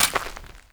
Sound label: Jester Step Dirt, Footsteps; Foley; Warframe; Grineer Royal Jester